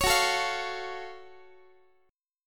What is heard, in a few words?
F sharp Minor Major 7th, Guitar; strummed